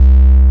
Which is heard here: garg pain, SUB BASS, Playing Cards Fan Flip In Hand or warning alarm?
SUB BASS